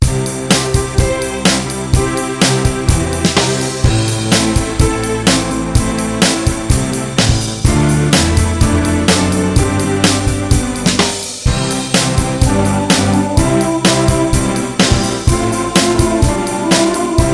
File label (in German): Freundschaftssong mit Melodie ohne Gesang